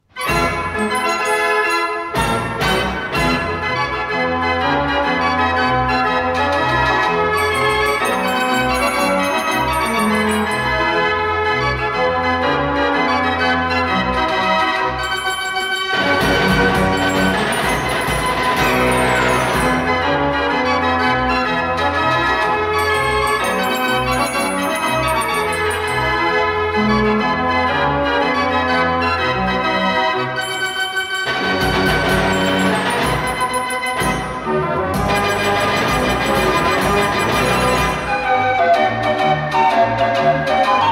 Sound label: March